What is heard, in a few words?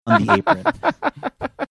laughing